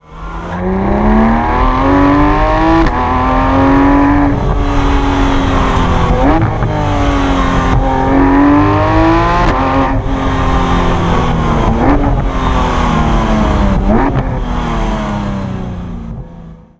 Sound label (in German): Sportwagen Sounds Porsche 991 GT3